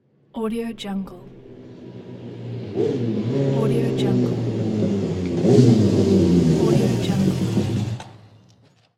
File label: motorcycle 900 approaches and shuts off